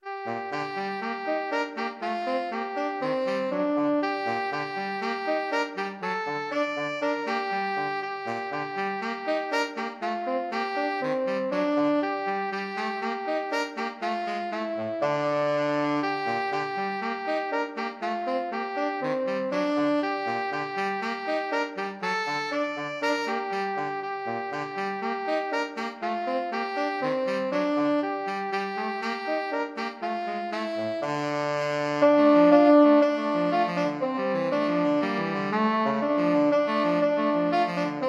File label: tenor sax duet